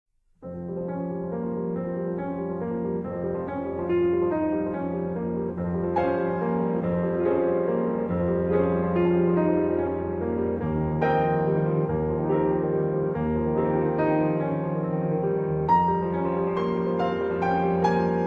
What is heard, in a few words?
Piano; 3rd Mov.; Riku